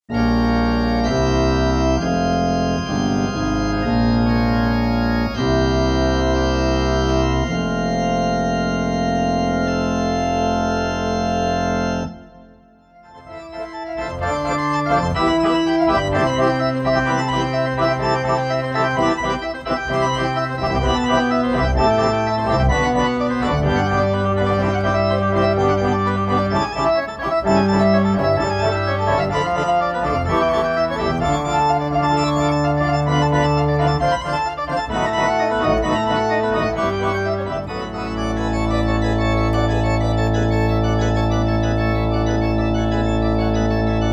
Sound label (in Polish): Organy; szkoła II stopnia